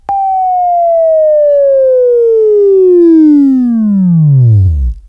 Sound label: Buzz; Labview-Program; Sweeping Training program